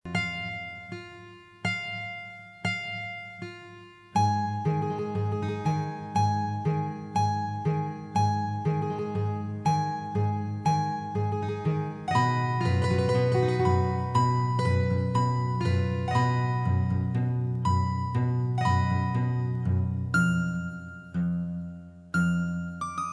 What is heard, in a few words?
Guitar